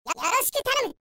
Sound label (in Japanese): ピッチシフターで怒れるネズミを使用した場合